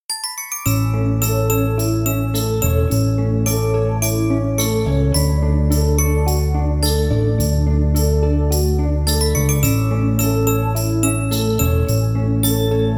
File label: Music for Winter Christmas